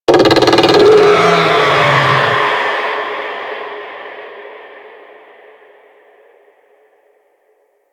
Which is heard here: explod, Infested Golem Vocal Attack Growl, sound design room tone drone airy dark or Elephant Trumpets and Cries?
Infested Golem Vocal Attack Growl